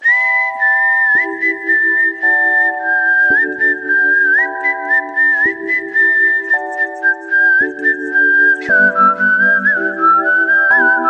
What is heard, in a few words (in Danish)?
flojte